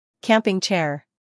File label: camping chair